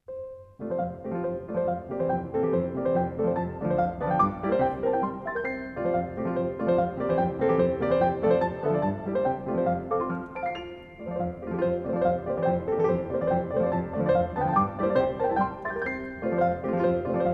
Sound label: Op. 25 No. 3 in F Major, Piano